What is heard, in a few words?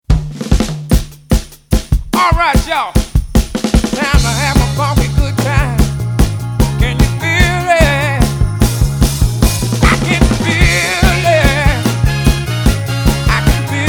soul music